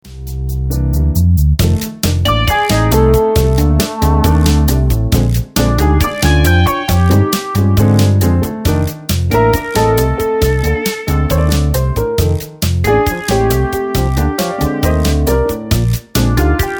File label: blues